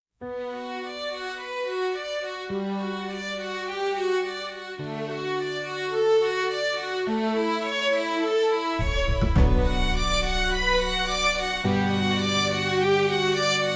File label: classical rock, instrumental